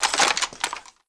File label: gauss draw, weapons